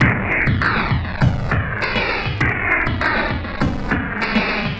POWER BUZZ; Distored Reality

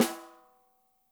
dry, snares; Spectrasonics - Burning Grooves CD2

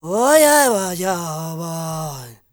Zulu male, Heart of Africa, male dry; Spectrasonics; Heart of Africa 2; S.MALEDRY

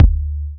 Kick, Kickdrum; Equipped Music; Loungin House

